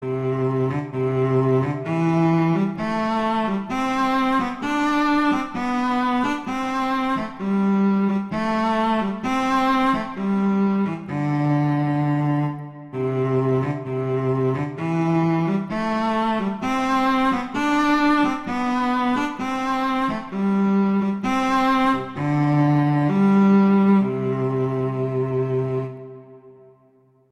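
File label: Humoresque, cello; Very Easy Collection; Dvorak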